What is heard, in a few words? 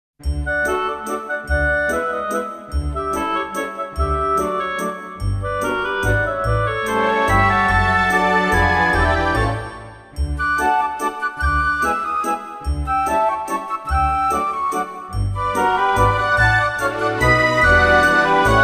Lovely Waltz; Roommate Asami: Okusama wa Joshikousei; music collection 2002